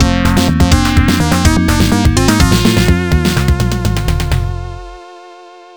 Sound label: Goal; european league soccer; world trophy soccer; j league champion soccer; genesis